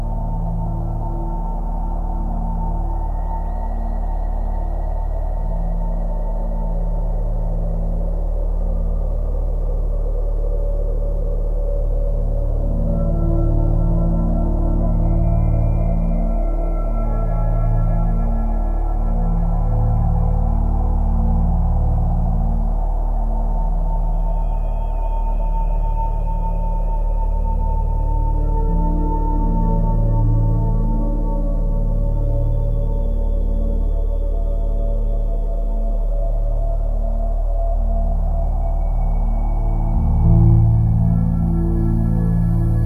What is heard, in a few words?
Meditation